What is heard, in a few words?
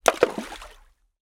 impact stone hit water rockpool beach splash